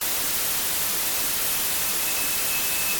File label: white noise